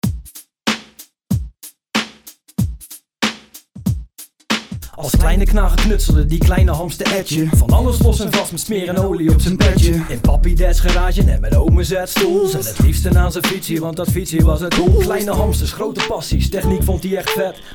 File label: Rap